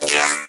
mech hurt

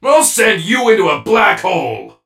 hurt; 瑟奇光年

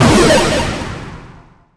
8bit gadget

